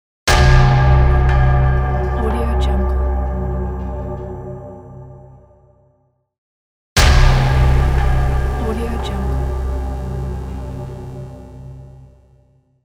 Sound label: thriller horror hit; phillipmariani